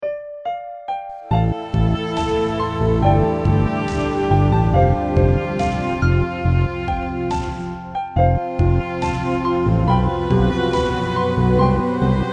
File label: Sound Stone Piano